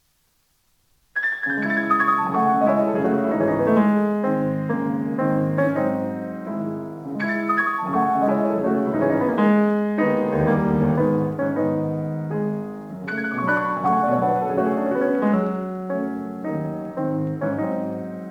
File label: Preludes; Chopin; Op28; Lympany